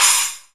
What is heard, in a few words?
cym splash